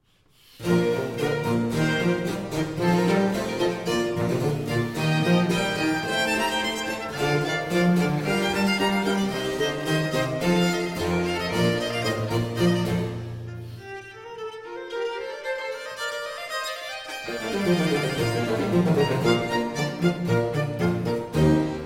Concerto For Recorder, 2 Violins and Basso Continuo in C Major, Allegro